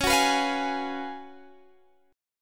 F sharp Major 7th Suspended 2nd Suspended 4th, C sharp